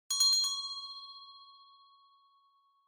Winning Bell Strong

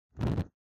Lighter Flame

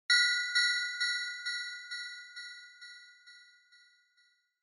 Inspiration Low Delay; Onoma

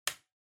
Light Switch Rocker, Dry Mid

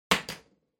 Lighter Drop

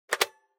Camera Instant Close

